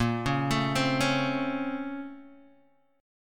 Bb Minor Major 9th Bb down Guitar Open D

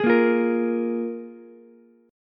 F Minor Double Flat 5th F Ukulele; Fmbb5 strummed; JGuitar

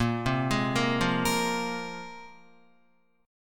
Bb 7th Suspended 2nd Bb down Guitar; arpeggiated; 4E,4A,5D,5G,5C,6F; Bb7sus2

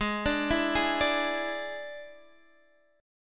D Suspended 2nd Flat 5th G sharp

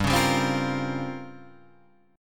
A sharp Minor 6th Add 9th G, Guitar chord

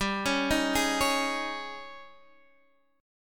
G sharp Major 7th Suspended 4th, G

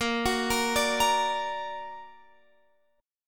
Bb Augmented Bb Guitar Open F; arpeggiated; JGuitar

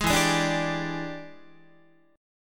E 7th Sharp 9th Guitar, chord; E7#9 strummed; JGuitar

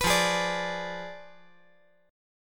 F Diminished 7th F down

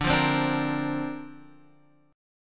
D-Minor 7th Sharp 5th D Guitar Drop D, chord

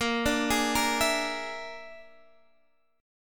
A sharp 7th Flat 5th A sharp, Guitar Standard; A#7b5 arpeggiated; JGuitar

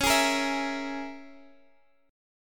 Db Minor 6th Guitar Half Step Down; strummed; Dbm6

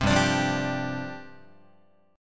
G 7th Suspended 4th G down Guitar

